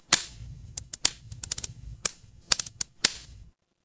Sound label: fire electric crackle